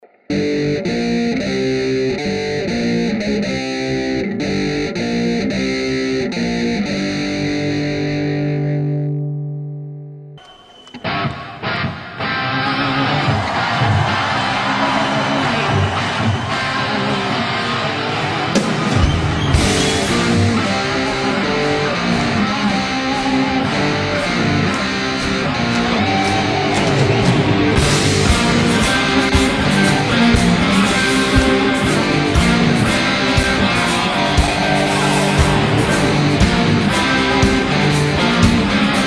acoustic smoke, Playing blues on 40th years old guitar